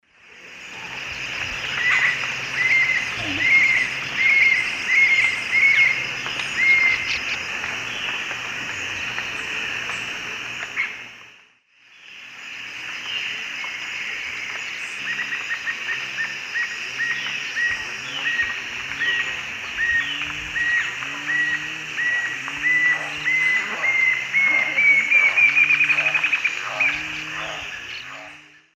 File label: Galbula tombacea, calls and song; S. America; Eastern Lowlands